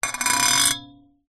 foley metal serrated metal edge scrape on metal pan